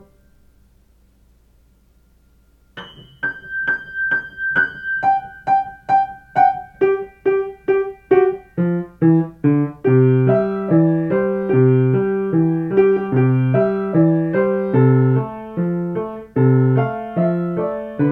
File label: Jingle Bells, piano